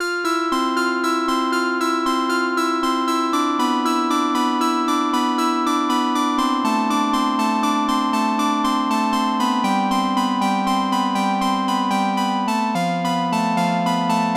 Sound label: poly percussive; p600